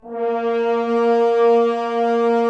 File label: Brass F.Hrns A#; Miroslav Vitous - Woodwind Brass Ensemble